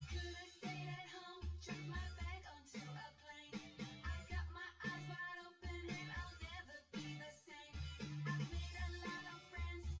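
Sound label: with drums synth conv 250ms 1m; SiSEC08; SiSEC underdetermined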